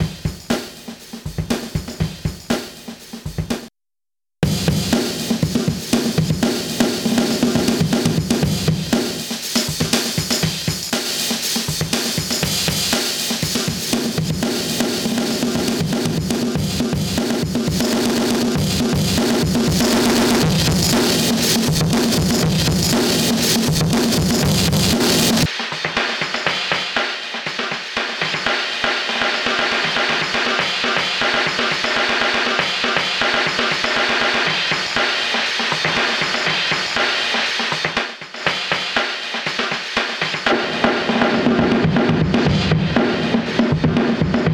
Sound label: drum loop